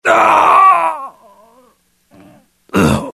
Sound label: death; mohaa; dialogue